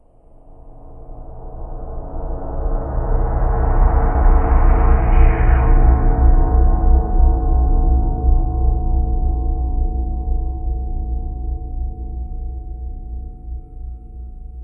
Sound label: Creepy Buildup